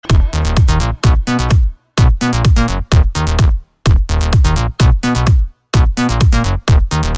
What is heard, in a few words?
Sms Electro